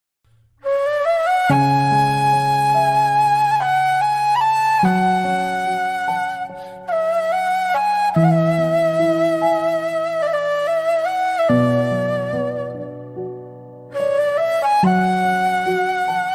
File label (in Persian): music gham arabi